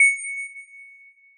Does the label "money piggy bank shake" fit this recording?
No